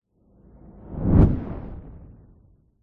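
sound design cinematic whoosh fast into impact dark fire like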